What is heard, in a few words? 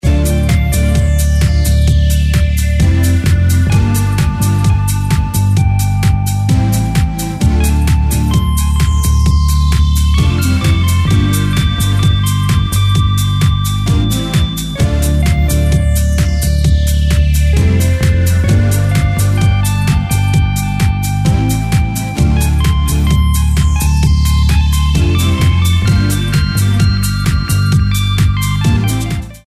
Funk, dance